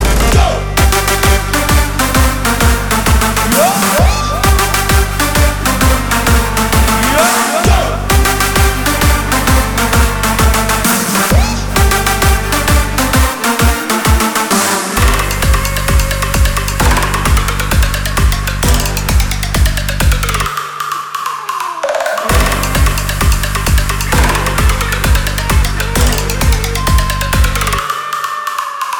רינגטון

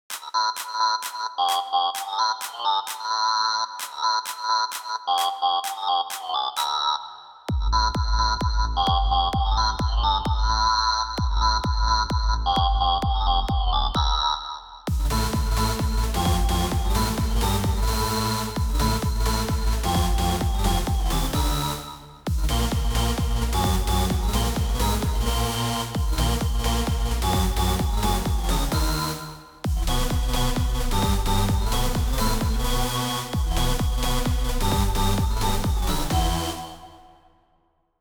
סקיצה

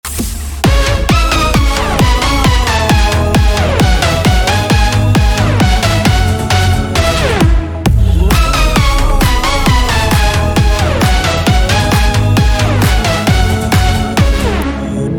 דרופ; synthmaster

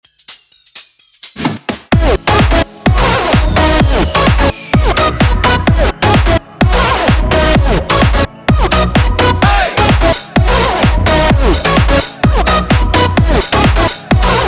דרופ

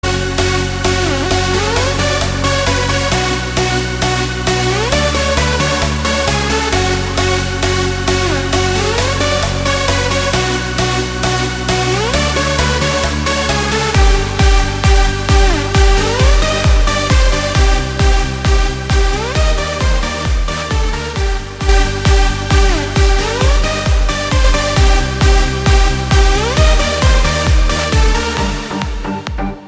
רינגטון

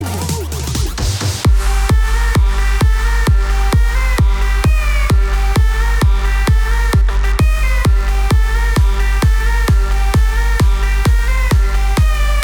רינגטון

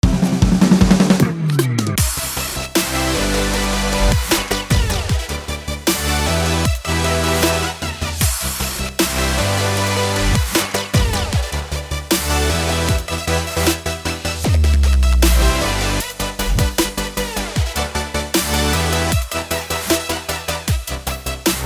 אחרי eq; טיפים מהסטודיו 3 אקולייזר eq ומיקס בסיסי

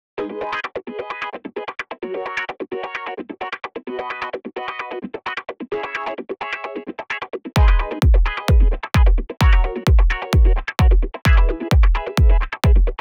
בסיס המקצב גיטרות

